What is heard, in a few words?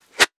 weapon bullet flyby; Stereo; Bullets; Real Guns Sound Pack 2